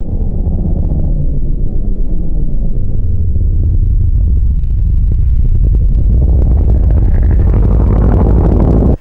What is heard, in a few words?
ascending synthetic sci fi motor startup